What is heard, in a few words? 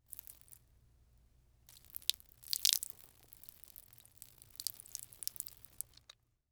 Human Urinating On Ground